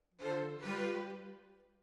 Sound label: Decca, Jazz for piano